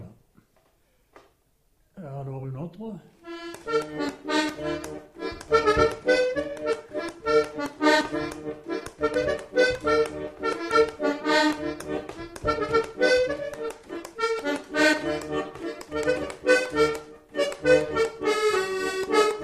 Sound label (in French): bourrée